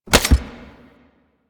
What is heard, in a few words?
shotgun mech; Warframe; Tenno; Alchemist Shotgun